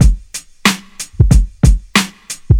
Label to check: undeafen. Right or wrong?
Wrong